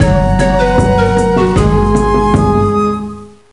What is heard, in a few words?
Aztec Win; Mystery Tales: Time Travel; NDS